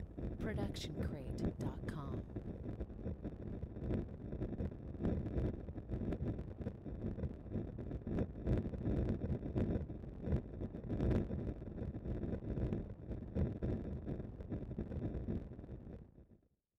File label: flames; soundscrate